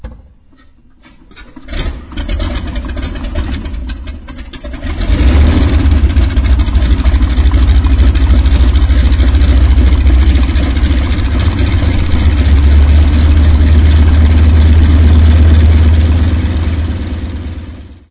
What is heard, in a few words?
piston prop start; rigs of rods